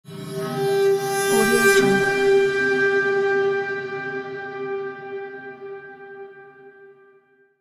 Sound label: epic hybrid horn